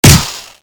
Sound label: drillgun